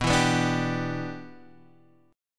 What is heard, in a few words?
B Suspended 4th Sharp 5th Add Sharp 9th B; Bsus4#5add#9 strummed; JGuitar